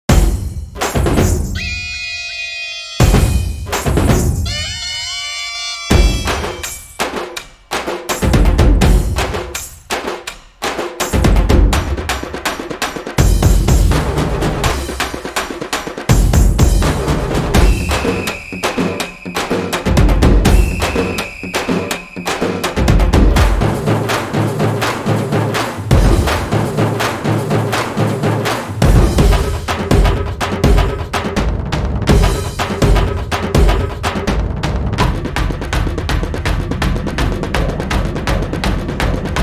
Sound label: Village Kuthu Theme Music (Instrumental); Rajini; Annaatthe